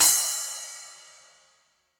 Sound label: Splash 10 Bright Room, Cymbal; Drum Hits; Standard Hits; Session Drums Studio